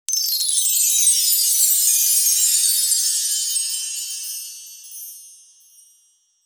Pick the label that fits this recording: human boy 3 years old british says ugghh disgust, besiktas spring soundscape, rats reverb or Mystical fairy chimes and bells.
Mystical fairy chimes and bells